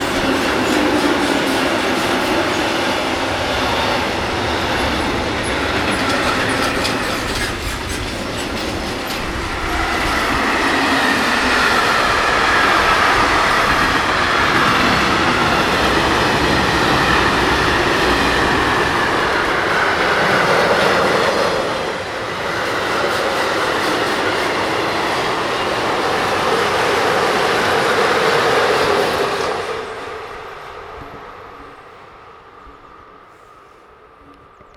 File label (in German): Gueterzug